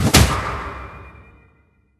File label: Mega Knight Knockback Hit; Linirea